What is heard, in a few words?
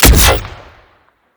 pistol fire large, weapons